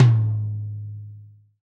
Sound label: TOM TOM, Real